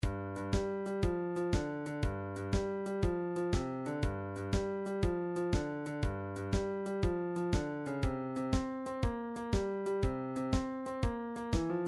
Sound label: midi drums, blues